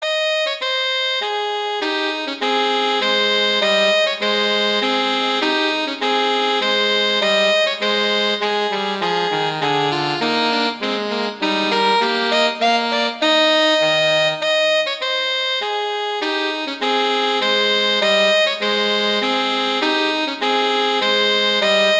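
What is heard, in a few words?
Duet, horn; Mozart; Duets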